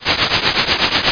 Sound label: eraser